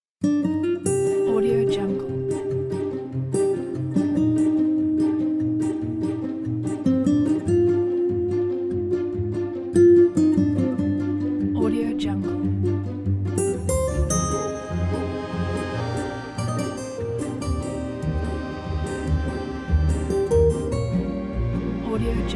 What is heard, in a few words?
tensions